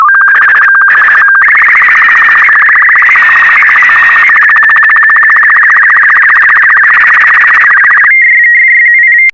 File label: sstv; R8BW